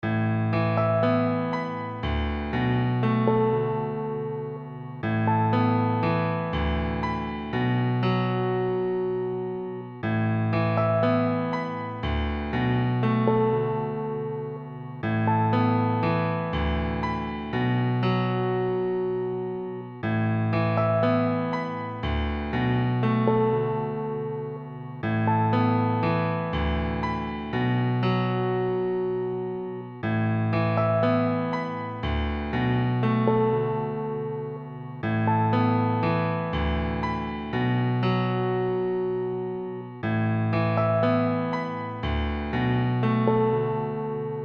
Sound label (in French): du beau piano solo